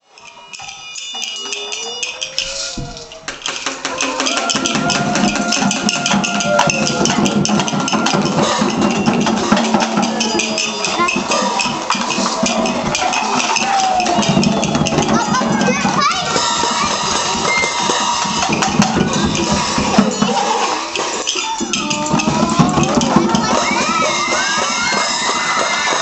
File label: Stormy Tropical